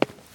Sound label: stairs step; Golden Crayon Sounds